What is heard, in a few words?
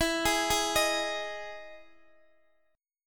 E Major 7th E, Guitar, Open D; chord shape